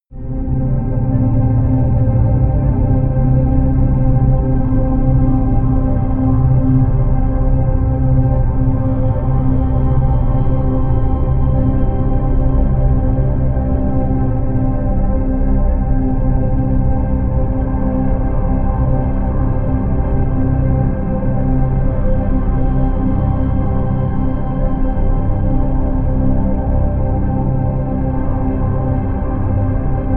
drone last flight

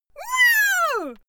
female cheer woo

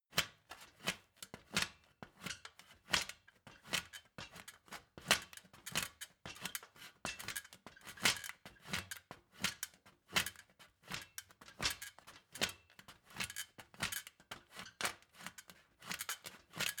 step ladder metal squeak; schritte

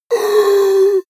monster inhale; kreaturen

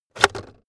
car backseat table pull out cupholder

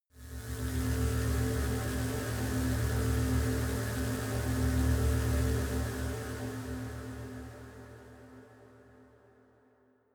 spaceship core short; raumschiff

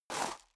step high heels gravel medium slide short; schritte; Footsteps; menschen

